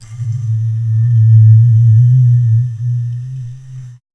an ancient sundatang bamb, cultural

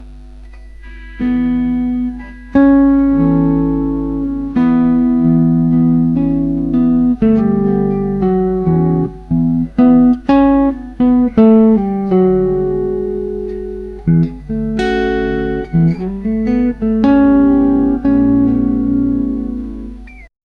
blues guitar strumming

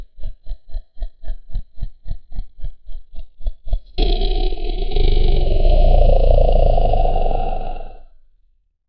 monster voice eerie and; monster vocal

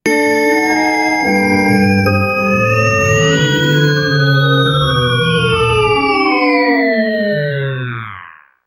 ding ding ding bonus roun; game show

